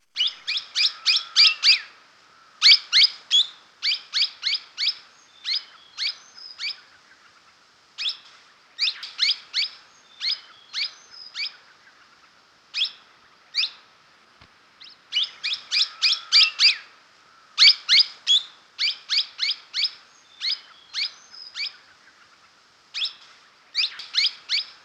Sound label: Parakeet, bird call